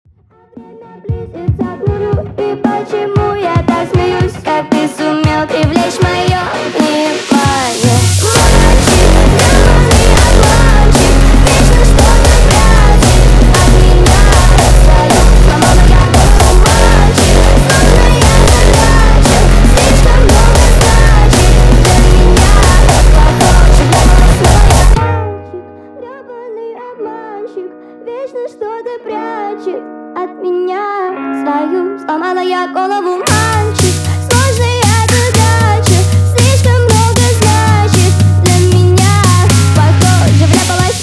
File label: SLOWED HARDSTYLE REMIX